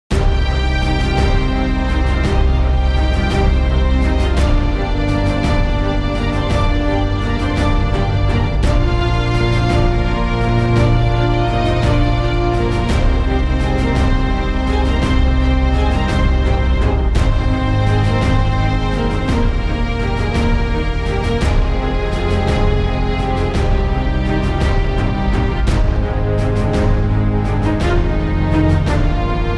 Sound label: GuitarMusic